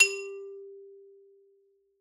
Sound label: Xylo Hard G3 ff far, Hard Mallets; Idiophones; Struck Idiophones; Xylophone